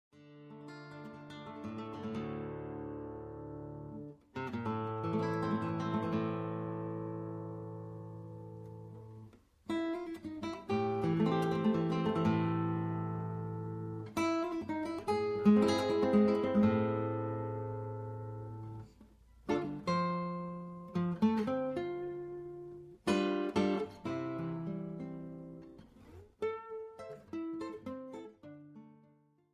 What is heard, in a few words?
Guitar solo